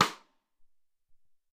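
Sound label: Ambient Rim, Alesis SR-18 Drum Machines; 300 Drum Machines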